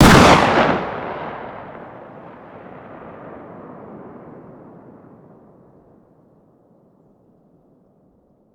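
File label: fire distant 12ga exterior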